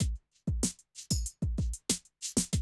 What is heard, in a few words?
Classic DrumLoops